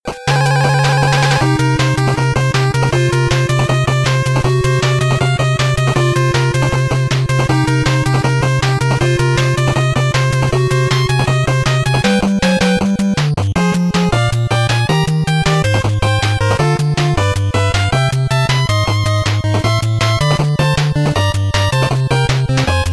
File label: BGM 8bit; Mighty No. 9; 8-bit soundtrack